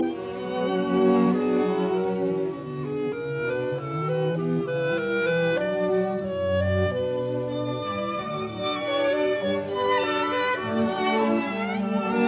Clarinet Quintet in B minor Andantino Presto non assai ma con sentim; Brahms; Op 115